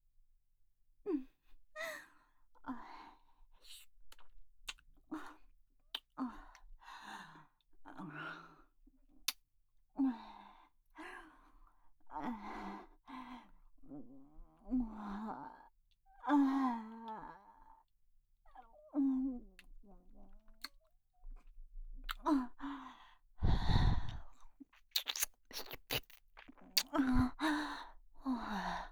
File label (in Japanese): キス; 【マゾハーレム】